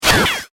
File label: Stun Gun, laser gun sound effect; Saturn Stun Gun; star wars / trek style games